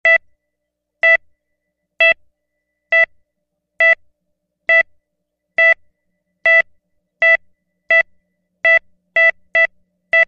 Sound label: Heart Monitor; with Flat Line 3; EKG Heart Monitor; Sporadic Repeating Medium Pitched Beep / Solid Flat line Beep, Close Perspective. Hospital.